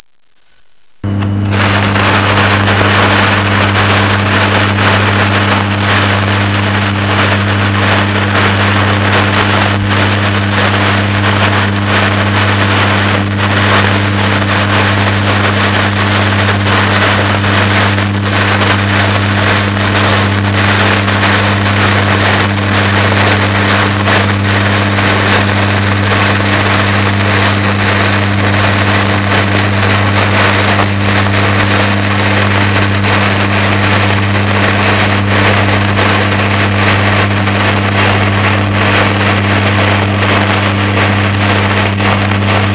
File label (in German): FEUER